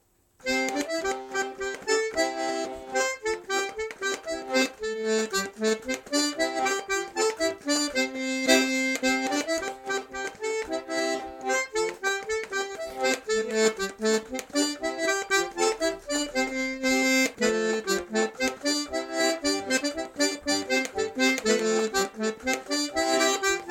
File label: polka